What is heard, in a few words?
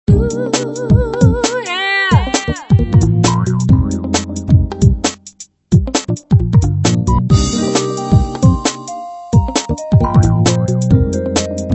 Beats; Motorola V191